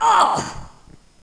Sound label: pain; alienarena; femforcer